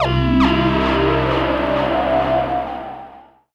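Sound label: synth, Production Effects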